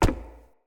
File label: Unarmed Punch Impact Wood Hollow Large; Weapon Impacts; Player; Environment